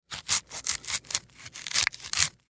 Food Celery Stalk, Foley Food Celery Stalk Chop Cut Slice Multiple Times; Vegetable; Blastwave